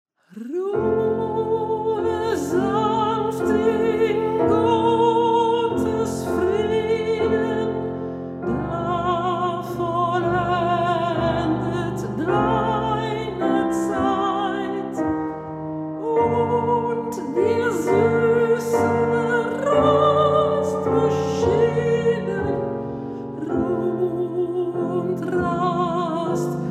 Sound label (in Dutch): zang en piano; Robert Schumann